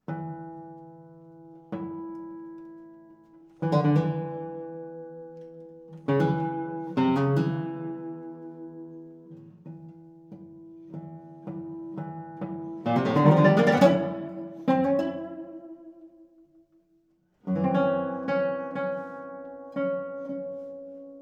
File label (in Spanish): Preludios epigramaticos